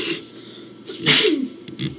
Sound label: sneeze